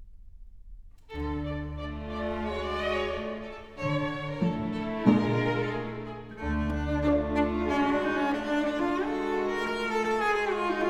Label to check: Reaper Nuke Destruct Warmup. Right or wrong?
Wrong